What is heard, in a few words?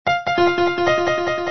piano